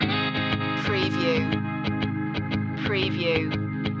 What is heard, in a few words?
sunny day loop